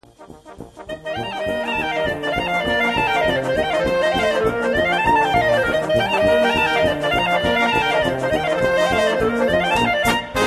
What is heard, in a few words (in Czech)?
Klarinetový čardáš